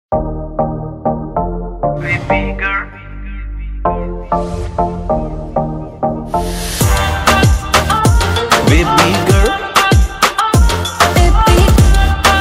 BGM punjabi ringtone

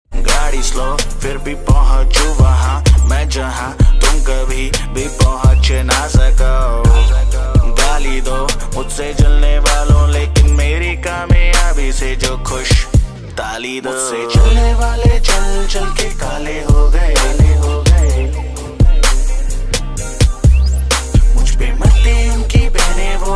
hindi rap ringtone

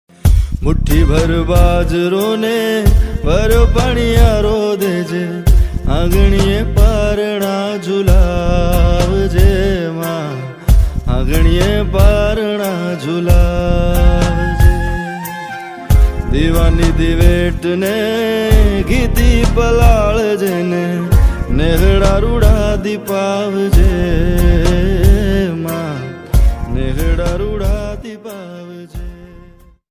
Gujarati ringtone

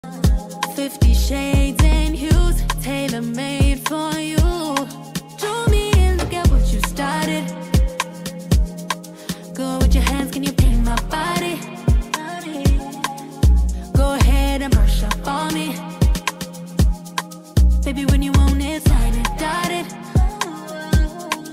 english ringtone

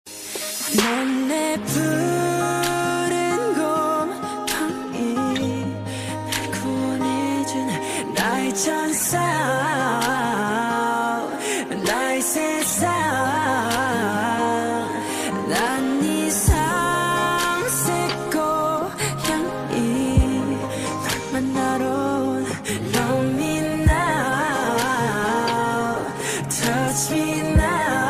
korean ringtone

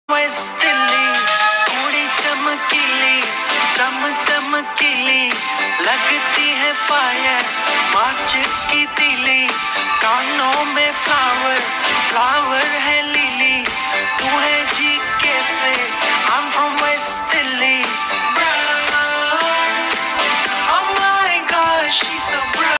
hindi ringtone

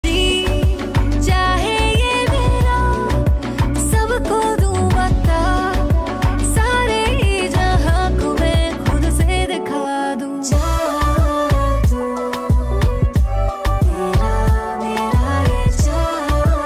hindi ringtone

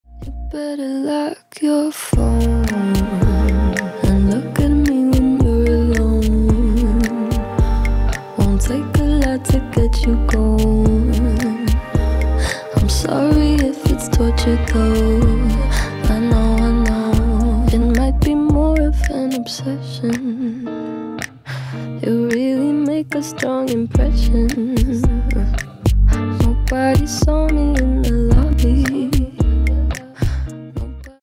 Bossa Nova english ringtone

